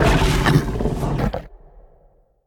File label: raise obelisk growl; smite; Anhur